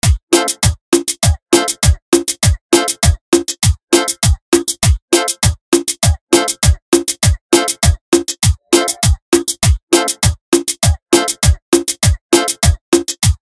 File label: Cumbia